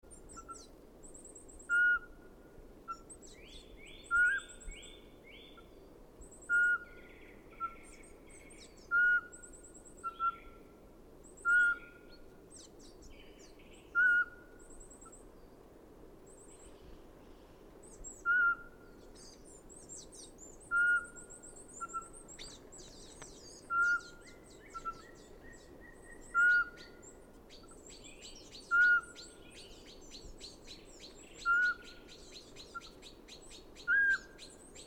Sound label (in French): chevechette forez; nord